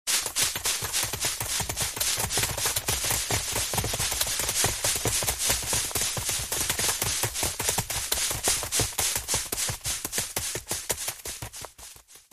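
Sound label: chaotic footsteps on grass; material zvukovogo effekta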